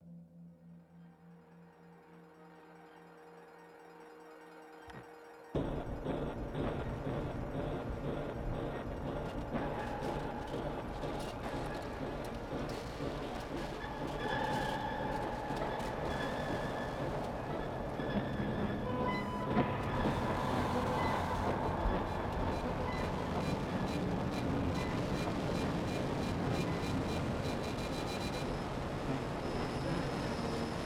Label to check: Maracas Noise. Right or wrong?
Wrong